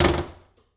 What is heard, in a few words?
Auto Nissan Altima Hood Unlatch; Transportation